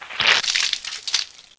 SP HUM; INC demo

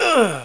hurt; a10cuba

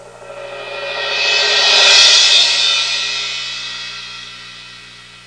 cymbal crescend; Sound Effects for Multimedia - Volume 1; percussion instruments

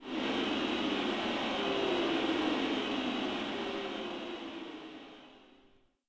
BOO

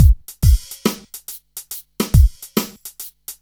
RNB LOOP; VST

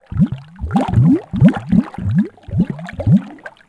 bubble, ambient

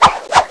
steal

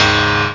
PIANO; The Atari Compendium (Toad Computers) (1994); music; synths

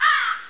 crow

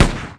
Helicopter Gun Fire; Red Shark

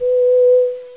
Flute B; RenderOrgan

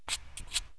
chalk, 9ball

